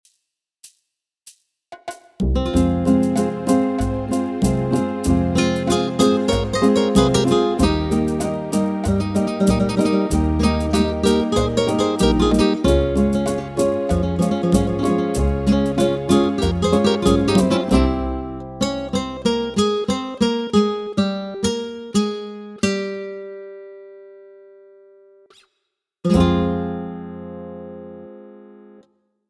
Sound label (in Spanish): Bolero Trio